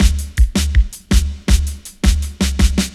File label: breaks 162